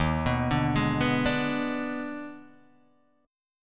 D sharp Major 7th Suspended 4th Sharp 5th, Guitar, DADGAD